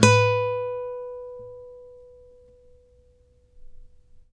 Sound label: ACUSTC B 3, ACOUSTIC guitar; East West; Steve Stevens Guitar Samples Collection; ACOUSTIC PCK